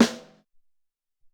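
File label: Gate Snare 1, Alesis SR-18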